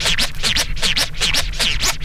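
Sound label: scratch, record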